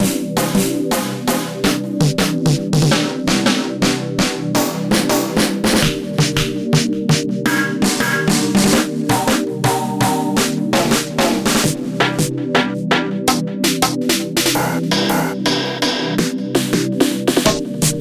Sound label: snare master; ドラムサンプリングCD; Snare Master(Vol.1&2共通)サンプリングCD試聴用デモ1; WAVELINE